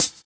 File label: Hhat, HiHat; Equipped Music; Loungin House